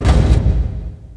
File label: Click In